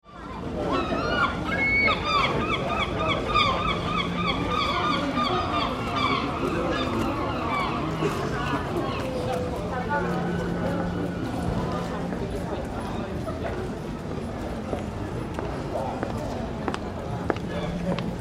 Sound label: Seaside Town Atmosphere, Street recording - Seagulls - footsteps; Seagulls - footsteps and background voices; City; Tom Hutchings